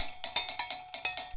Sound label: bulu grooves; Best Service; World Colours